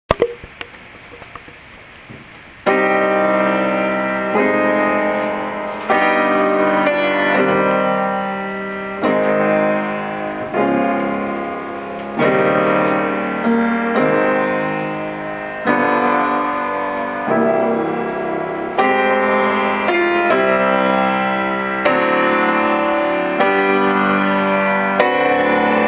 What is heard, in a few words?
prelude in c minor